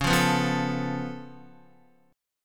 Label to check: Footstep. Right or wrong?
Wrong